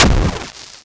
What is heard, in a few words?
Enemy Die